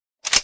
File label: rg6 reload start